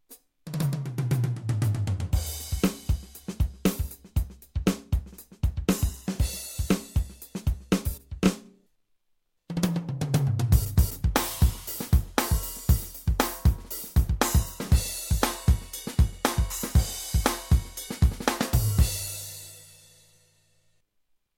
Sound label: tyros2 Drums Voice